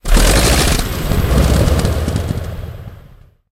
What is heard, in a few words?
sherman m4a1 engine load, tanks; engines; War Thunder